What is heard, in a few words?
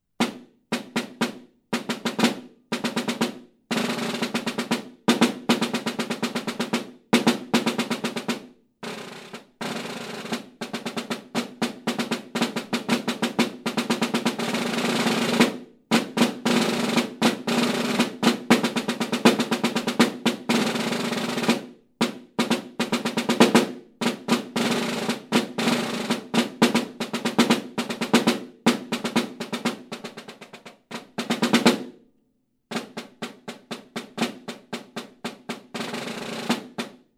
Snare Drum